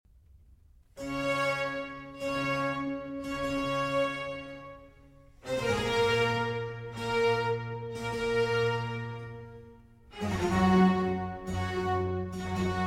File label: Cello Concerto in G, Largo; Vivaldi; RV413; 2. Largo